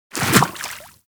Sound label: Small Land Water, Footsteps; Enemies; Warframe